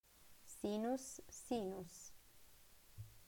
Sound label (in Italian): sinus